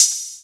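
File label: Hi hat Halo Open, Akai MPC5000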